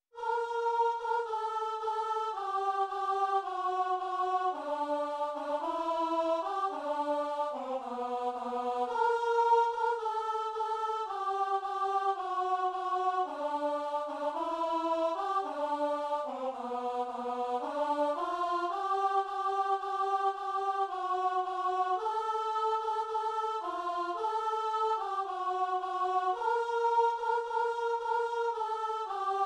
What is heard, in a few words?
alto; now I see